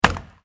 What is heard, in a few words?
bar tree; New Yankee in King Arthurs Court